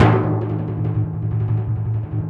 Timp FX; Roland LCDP03; Ambo TimpRol

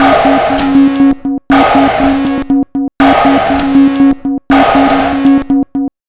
Pure Tabla, 080BPM; Zero-G

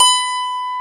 HARPSICH

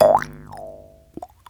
JEWS HARP; Jew's Harp & Spoons

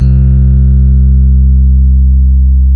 ROADZ C1 MED, Suitcase E.P; E-MU Producer Series Vol. 2 – More Studio Essentials; Keyboards

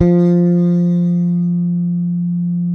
bass, sustain; Sampleheads; Will Lee Bass Library

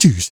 Voice Kick; Roland BK-5

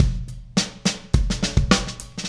Beat Fill, Indie Rock

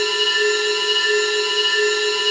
Agogo Noise, Roland BK-5

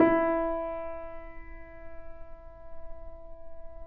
PP E 6, Grand Piano off mic; AKAI S6000 CD-ROM - Volume 2

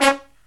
LOW HIT, Brass

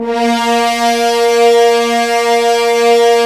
Horns; Roland - LA Composer vol. 4

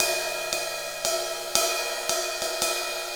Ride Cymbal Pattern

